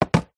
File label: food golden gaytime crumbs in metal tin set down table